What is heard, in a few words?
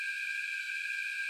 magnetostriction